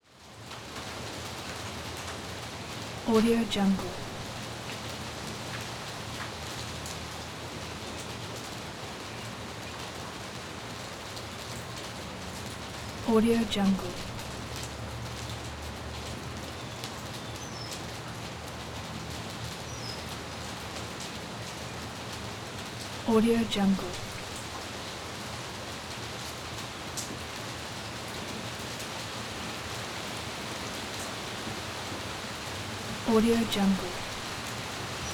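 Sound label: ambience suburb; prosoundfx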